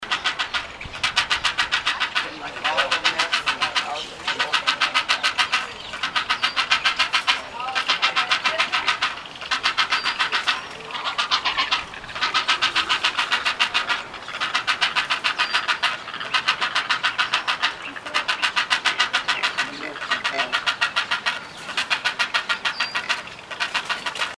Great Blue Heron, Ardea herodias; wading largewater

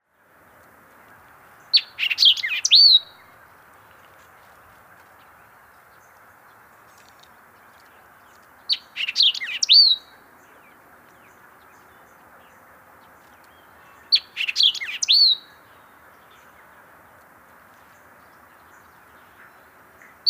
White-eyed Vireo, Vireo griseus; vireos

